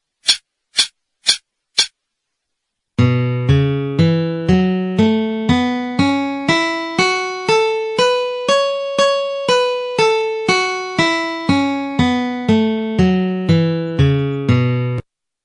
F Sharp Minor Pentatonic 120

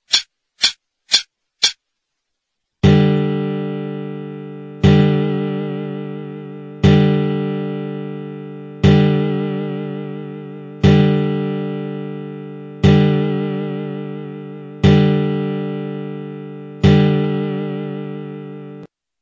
vibrato

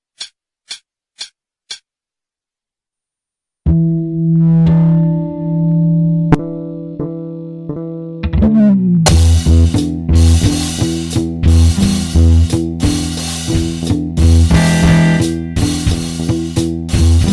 intro backing, 88

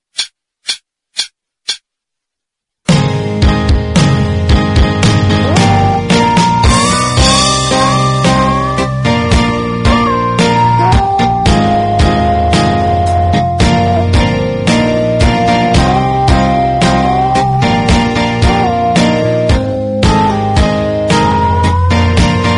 Intro backing 56

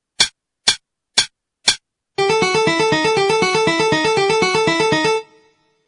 Shred 120

